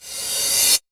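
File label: TR909 Crash Reverse; Roland SH-32; Drumset 03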